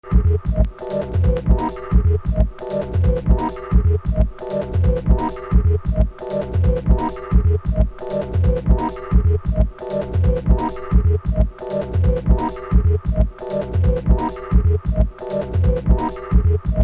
loop b (locked groove); House

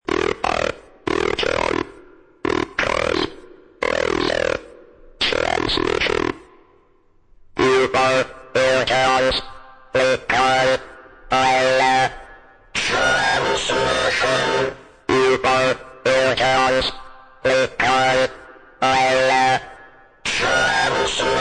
acapella

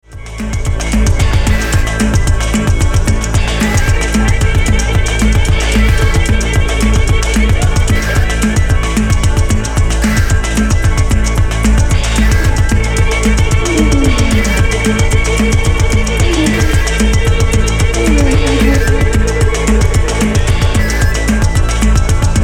Slow Rock Harmonica

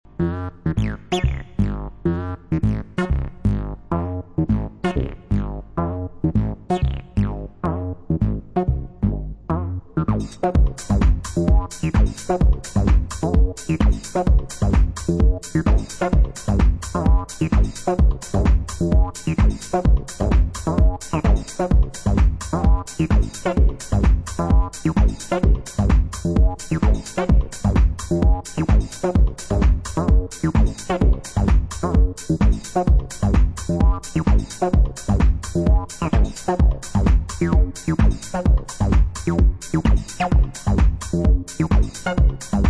horseshoe - bassfunk

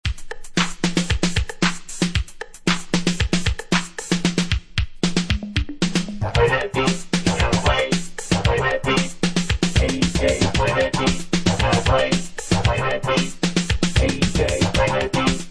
Instrumental; electro

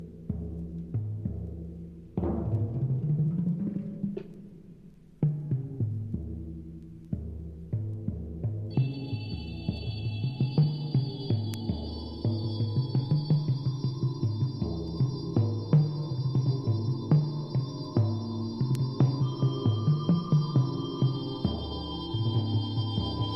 Drums Suspence

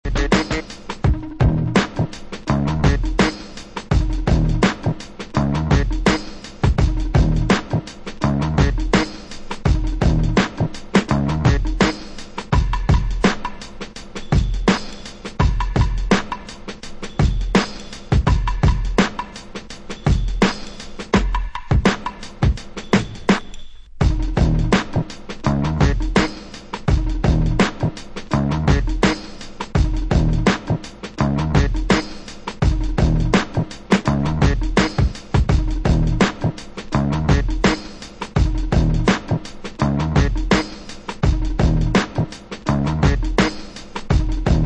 version instrumental

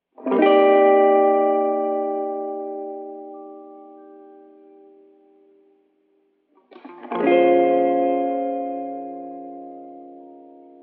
Cue Ball Guitar Wet (Stem)

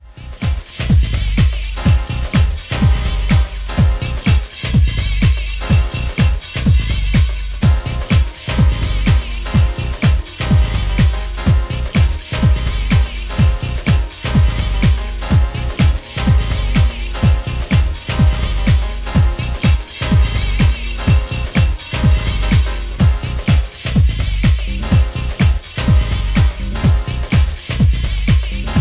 piano track

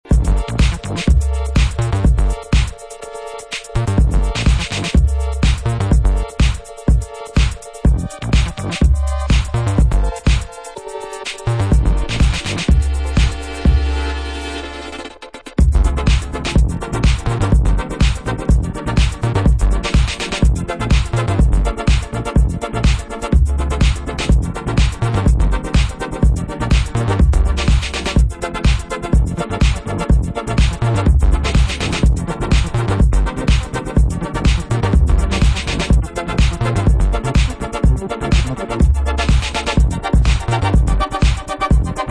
instrumental remix

